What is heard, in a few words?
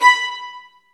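STR, Roland String Master Series, Violins Marcato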